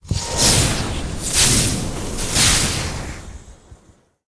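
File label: Trap Electric Turret attack; monster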